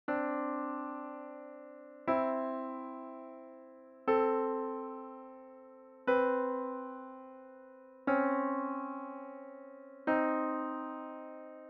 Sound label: altered intervals; shosys academy; conventions of labeling intervals